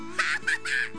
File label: hahaha